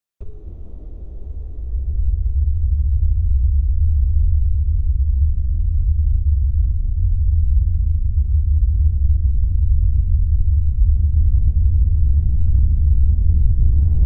mechanical hums distorted, science fiction

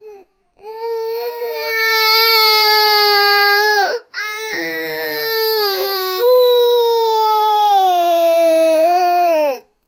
10 years old a boy, crying

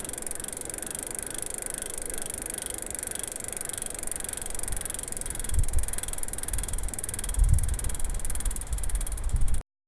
bumpy rattling sound of, bicycle

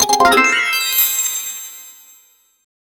a sparkly rainbow effect; abstract

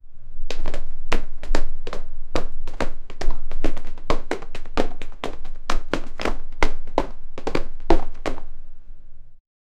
man running

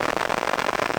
digital

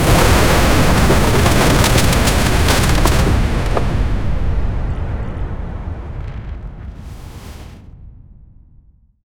fast sharp whoosh of, debris

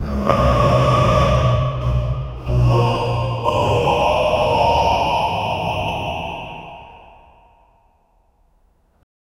a andrewsarchus; prehistoric; mongolien

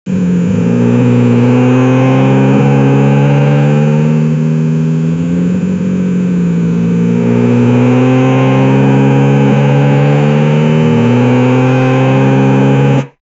sport car engine sounds

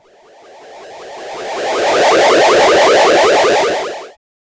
retro 8-bit

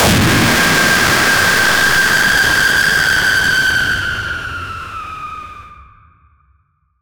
horror jumpscare intens

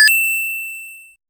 8 bit retro coin collect

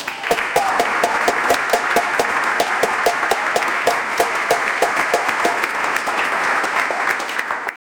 clapping in an auditorium

